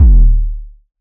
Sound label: electronic kick distorted; SoundsCrate